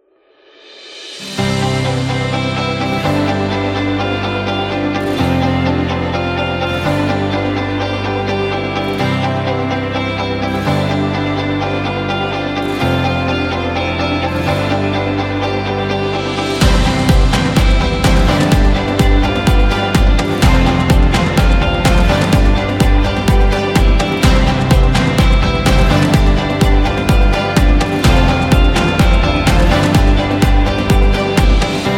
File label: A Dynamic; friendly